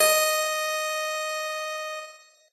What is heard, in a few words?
piano; pianojs